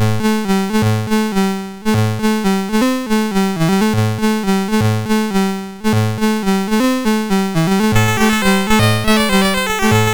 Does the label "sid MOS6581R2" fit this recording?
Yes